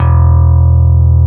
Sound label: SLAM BASS E2; Drums In Your FACE!